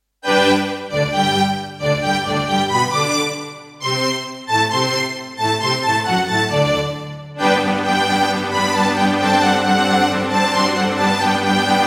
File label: Roland SC-88 Pro 55 MAP; classical; Mozart; Eine Kleine Nachtmusik partial